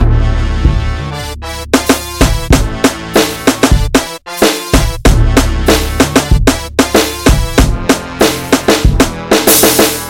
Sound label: Jazz